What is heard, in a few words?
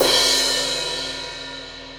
18 inch alpha brilliant metal crash, cymbal; Paiste